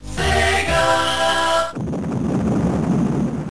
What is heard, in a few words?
Logo; Sega Logo; Space Harrier; 32X